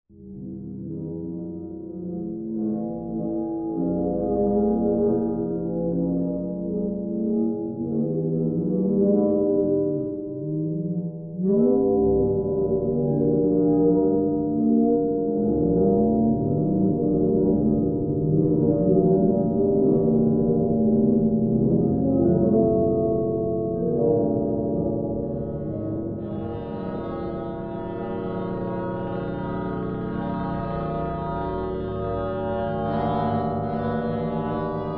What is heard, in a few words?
long steel fric